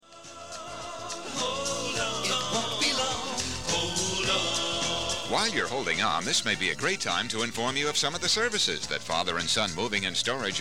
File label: Hold sample